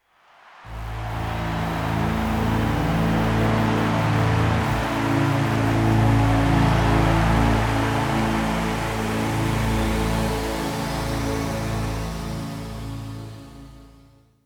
Logo; Sega Logo

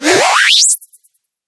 Charge; Chaser

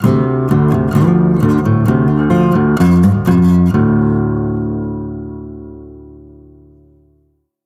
complete sting

